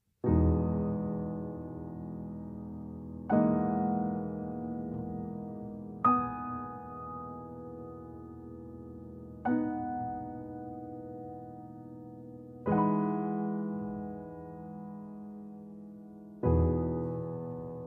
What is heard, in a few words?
EMaj7